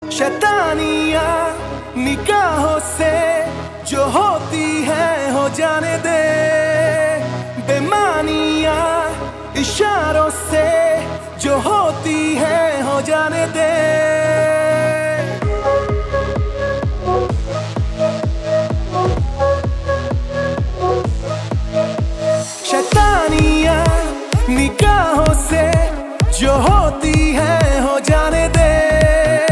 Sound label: Ringtone